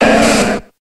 Grito de Gloom

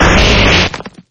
Grito

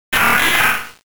Grito de Lombre

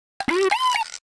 Grito

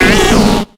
Grito de Pineco

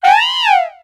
Grito de Liepard

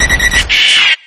Grito; Doduo

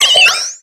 Grito de Piplup

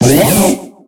Grito de Accelgor

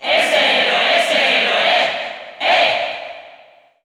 Grito del público; Heroe; Español NTSC; SSBU

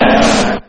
Grito de Gloom

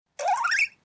Grito de Chespin

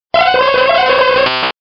Grito de Hypno

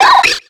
Grito de Riolu; sexta generación (XY)

